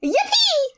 yahoo; birdo; SM64 CO-OP

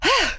panting; birdo; SM64 CO-OP

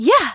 yah wah hoo; pauline; SM64 CO-OP

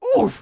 ooof; foreman spike; SM64 CO-OP

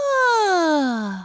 yawning; pauline; SM64 CO-OP

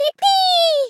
Yippee; Miku; SM64 CO-OP; Triple Baka Pack

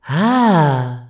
hoohoo; rosalina; SM64 CO-OP

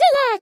Attacked; SM64 CO-OP; Triple Baka Pack; Miku

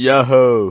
"yahoo"; SM64 CO-OP; Bob's Burgers

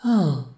panting; rosalina; SM64 CO-OP

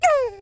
doh; yoshi; SM64 CO-OP

